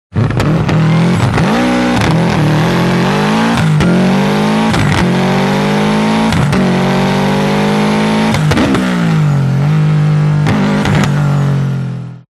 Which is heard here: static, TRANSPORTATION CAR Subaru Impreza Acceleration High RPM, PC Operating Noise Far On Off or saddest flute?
TRANSPORTATION CAR Subaru Impreza Acceleration High RPM